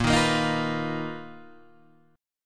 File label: B Major 7th Flat 5th A sharp, chord shape